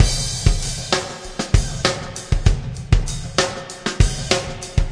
97 concert hall lush rock, drum loops and breaks; 091 to 100 bpm

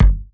kick tube two tone; Kicks; single hits

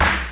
lofi sega sample; LO FI and 8 BIT KITS; Lofi Sega Samples

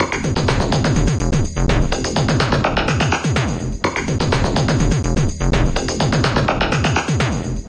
125 bpm heavy thick 16ther, drum loop

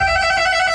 orchestra string hit D

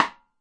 solid rimshot, snare; single hits

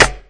snare

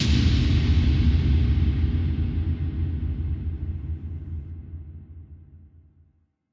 drop kick

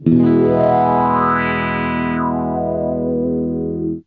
wah chord e, Guitars

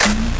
snare buzz; drums single hits

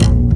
Upright Bass Notes

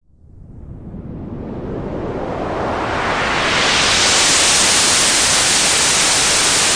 noise MiniBrute, Noise Sweeps

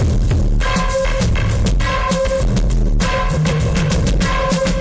100 bpm nord beat, drum loops and breaks; 091 to 100 bpm

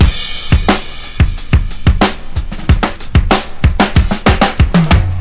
92 bpm big break, drum loops and breaks; 091 to 100 bpm

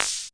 T6 Hats; DRUM MACHINES

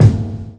kick springy thingy; single hits

